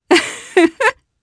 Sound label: Laugh; kingsraid